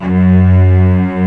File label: Arco Celli F#1